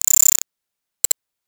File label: buffer fuct loops